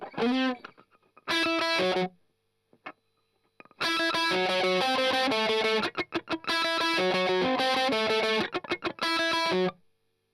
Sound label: Strum Octav